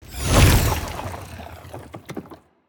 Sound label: Earth Bolt; SNKRX